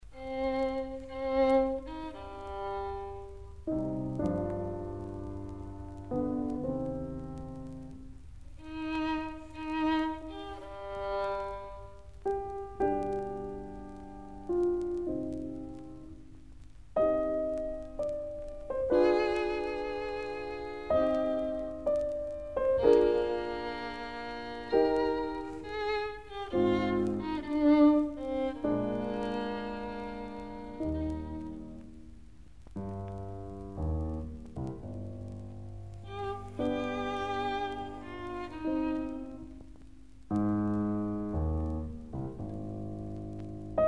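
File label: violin sonata; weber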